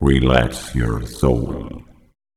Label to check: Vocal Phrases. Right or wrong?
Right